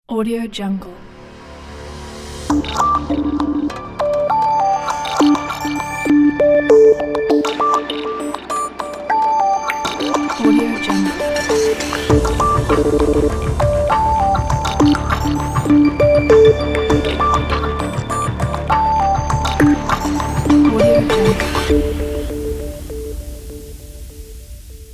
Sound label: fairy glitch logo ident pack